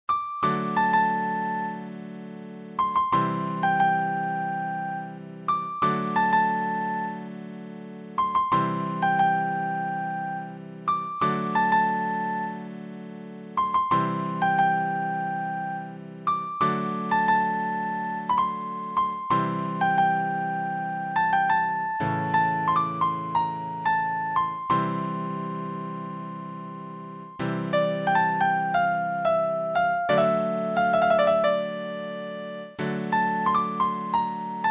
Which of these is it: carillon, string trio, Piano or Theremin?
Piano